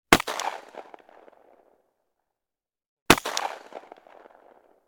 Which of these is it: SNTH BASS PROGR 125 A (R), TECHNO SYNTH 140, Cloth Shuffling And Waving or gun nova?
gun nova